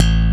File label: Pop Slap A0; Digital Sound Factory Xtreme Lead